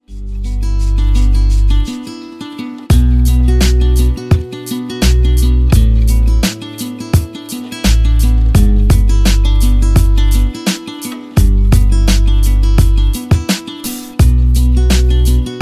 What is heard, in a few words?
Melody Guitar